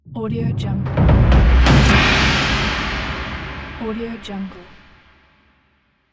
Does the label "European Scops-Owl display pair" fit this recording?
No